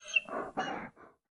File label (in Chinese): 熊猫担忧声